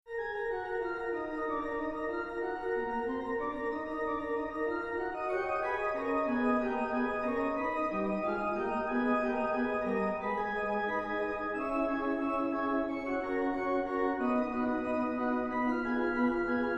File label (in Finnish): organ d molli fuga, urkuteosta; j s bach organ toccatas; Toccata ja ffuuga D-Molli (BWV 565)